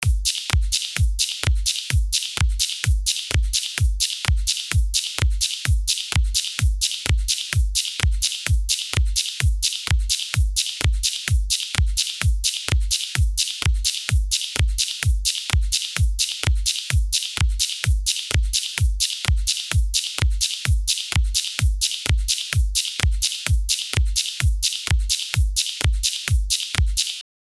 128bpm intro of track, progressive house

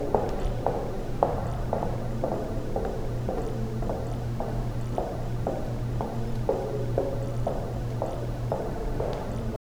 old hospital hallway ambi

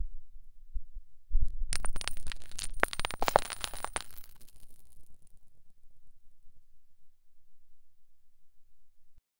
satisfying sound of gold

